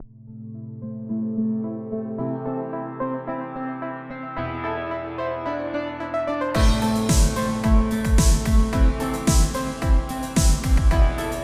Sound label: Pianos and Snares; Lavender Pianos and Silver Snares 2